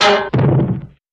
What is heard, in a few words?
Boing Blat Trip Fal; boings